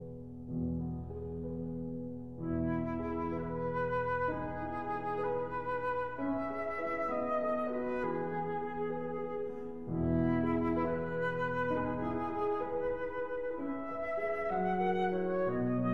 Berceuse (Lullaby) for flute and piano

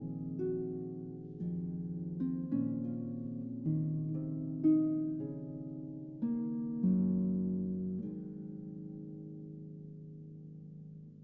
Harp Concerto Lullaby

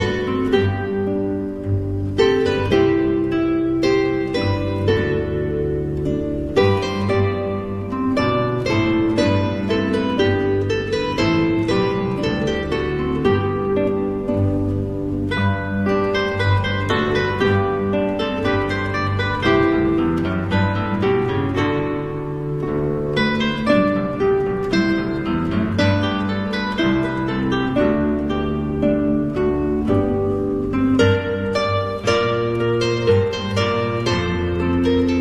Guitar Quartet; Terpsichore- Ballet; Christmas

